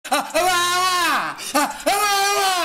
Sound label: wahh wahh